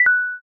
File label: stop button